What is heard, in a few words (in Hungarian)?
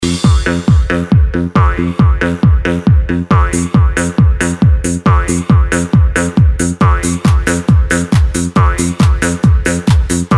Doromb sms